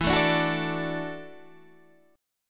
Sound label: E 9th Suspended 4th E down Guitar Standard; E9sus4 strummed; JGuitar